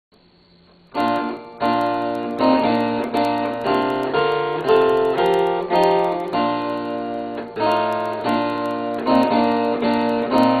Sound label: lullaby b flat